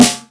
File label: natural snare drum b key; snare drum samples